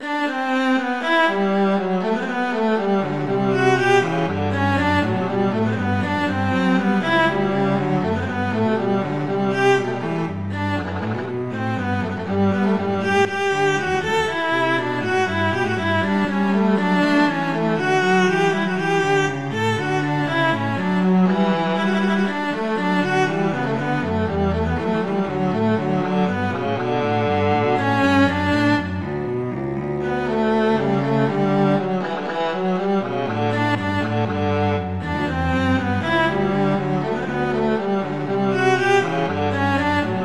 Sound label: Allegro; Telemann; Canonic; Vla Vc; Sonata 5